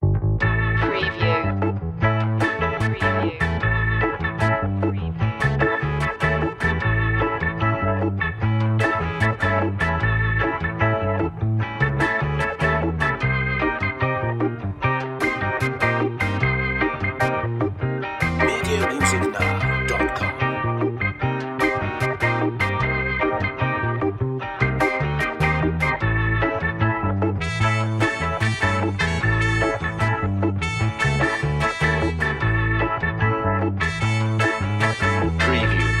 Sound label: funny fight no drums; brisk